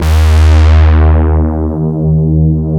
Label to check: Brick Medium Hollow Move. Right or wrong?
Wrong